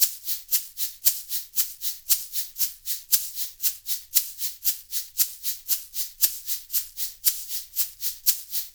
LOOP, SHAKERS, Percussion

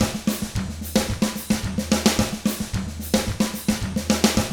110 SMBA samba; ILIO; Double Platinum Drums 1

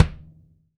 AMB KICK; ST AMB KICK

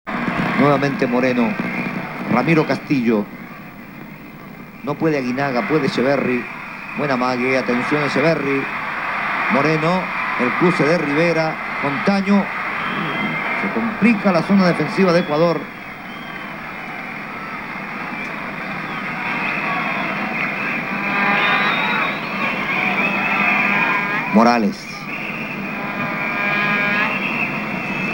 Salsa Picante; Masterbits; World Zone VOL-3

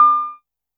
korg trident

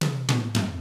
RB 6 8, R N B drums; ILIO; Double Platinum Drums 1

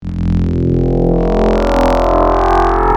X Static, wavetables; Waves Codex

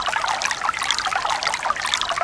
stream; Back In Time Records - Big Bang

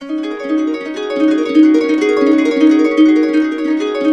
DB, Harps; Complete Harps; USB Soundscan vol.62; AKAI

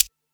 Quasimidi 309, Drums

